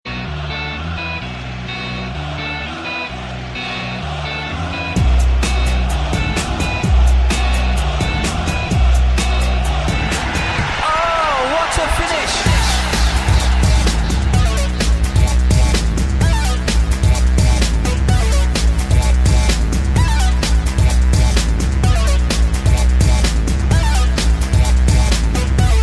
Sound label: Techno Goal